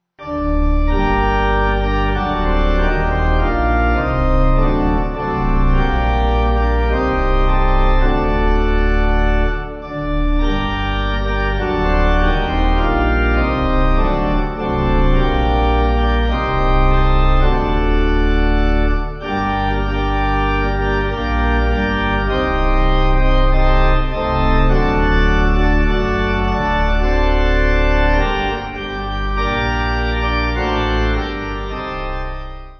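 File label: Pipe, Pipe Organ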